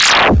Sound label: damage